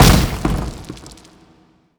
medium rock split

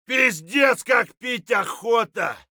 dehydrated; Escape from Tarkov

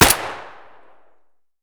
p226 fire close; Escape from Tarkov

